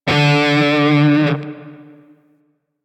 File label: Shawzin Flourish Oct Two D; DE; Warframe; Grineer Shawzin